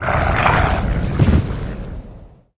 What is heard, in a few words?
flame hound dead, monster